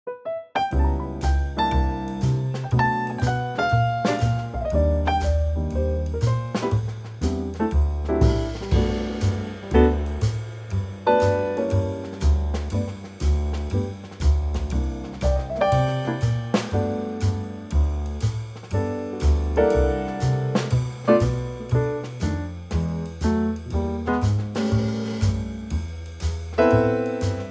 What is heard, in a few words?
G Trio